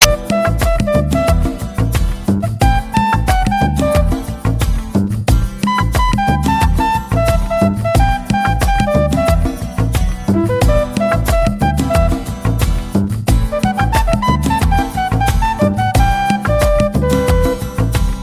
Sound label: Best Saxophone Music